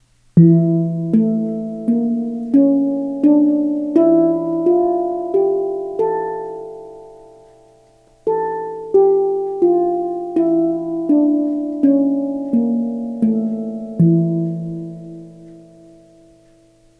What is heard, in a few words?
E Hijaz scale